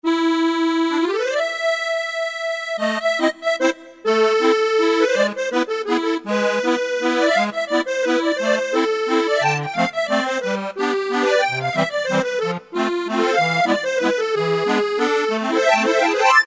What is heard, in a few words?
Musette, Korg N364; Factory Prog demos